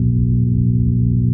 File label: device hum